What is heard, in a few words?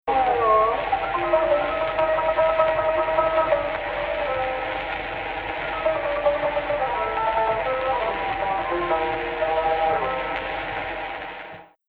AHH, Egypt Rast